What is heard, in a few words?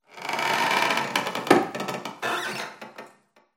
sanctuary wood; Half Life Alyx